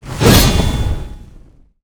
Reaper Attack

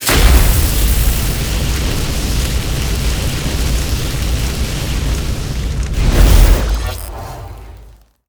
Plasma Ball Cast

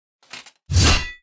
Warrior attack; AquilasTemple

